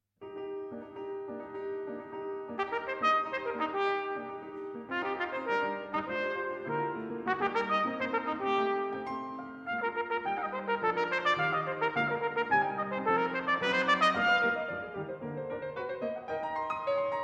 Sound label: Trumpet & Piano, Allegro; Trumpet Concerto in C Minor, Op. 113 Poème; III. Allegro Vivace